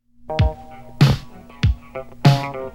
Kick

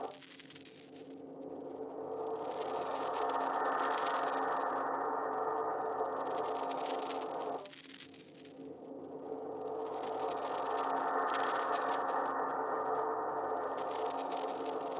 ambient loop

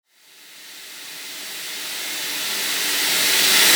128BPM Reverse; Shockwave - Fx Elements vol 2

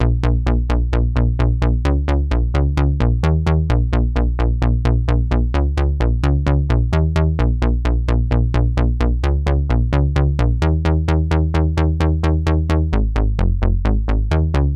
Synth Bass

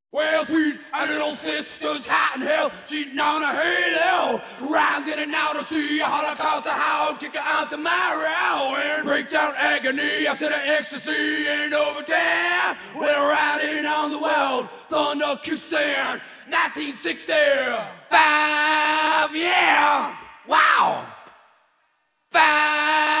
vocals

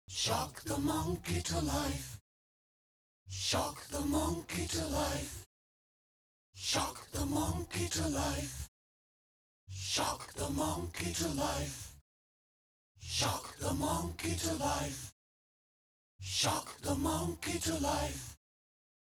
B Vox

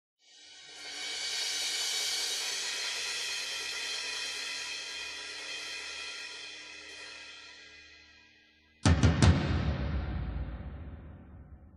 drums

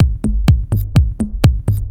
Deep Drum Loop; Multitrack

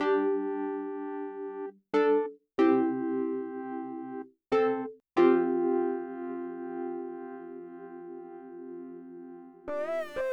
Keys FX; Multitrack

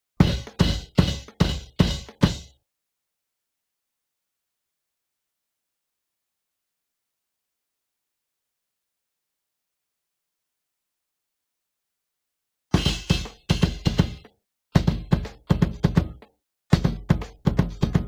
Bass Drum; Multitrack

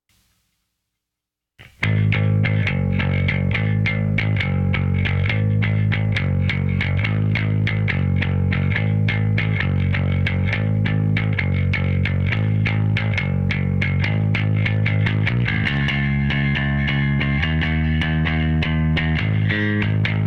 Bass

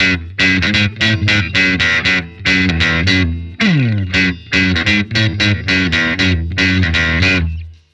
Breakdown Guitar; Multitrack; Remix Parts

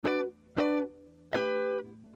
Guitar

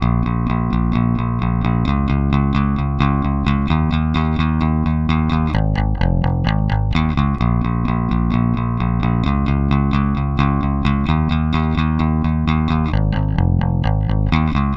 Bass

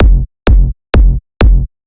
128BPM solo; Shockwave(PIL) - Future Big Room vol 2; Play It Loud Kit

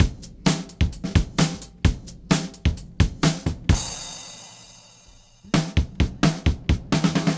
Drums

